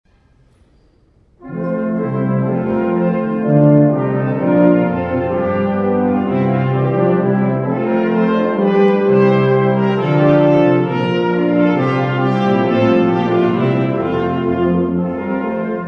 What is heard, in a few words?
Ballet de Grenoville, Brass; Terpsichord